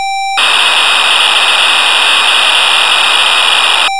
Roland Juno 106 factory presets bank a, Cassette Interface; Synthesizer Factory Patches